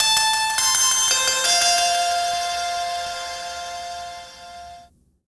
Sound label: callring